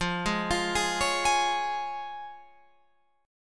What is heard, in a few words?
F Minor Sharp 5th, Guitar Open F, chord; 17,15,17,15,16,15; Fm#5 arpeggiated; JGuitar